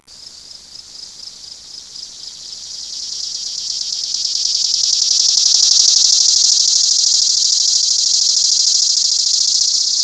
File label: chloromera, Cicadas